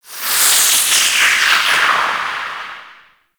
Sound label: Stadium Hazard Spark Projectile; Gameplay; Warframe; Nineteen Ninety Nine